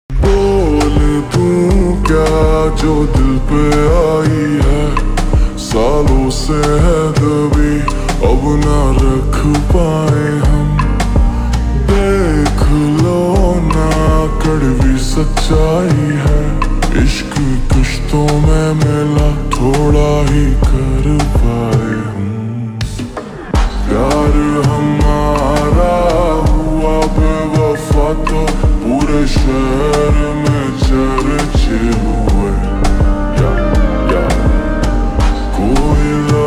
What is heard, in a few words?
Ringtone slowed and reverb